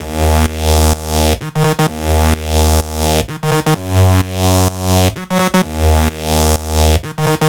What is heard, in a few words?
bass 128 D#m; Electro; bass loops; Anthemic